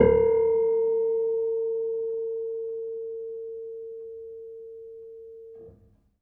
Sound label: Harm Low, Piano Harmonics